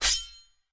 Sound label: lance pull; smite; lancelot